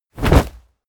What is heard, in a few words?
bodydrop textile